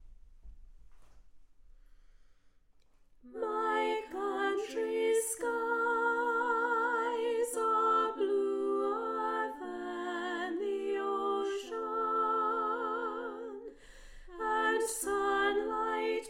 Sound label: A song of peace soprano